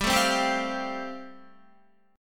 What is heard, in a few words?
G 7th Suspended 2nd Suspended 4th G, Guitar; G7sus2sus4 strummed